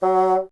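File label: wind bassoon F#; Miroslav Vitous - Solo Instruments VOL-1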